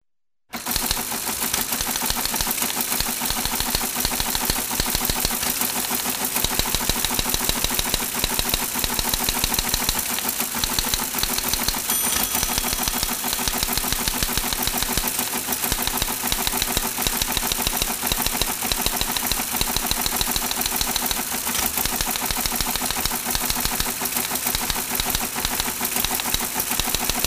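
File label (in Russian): телетайп звук печати по перфоленте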